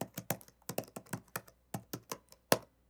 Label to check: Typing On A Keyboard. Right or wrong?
Right